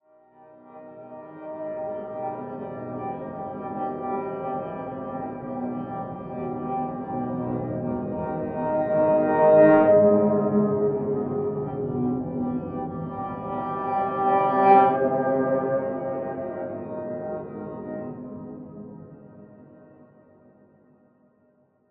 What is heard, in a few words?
Novum TIMBRE Flower Rechts; Dawesome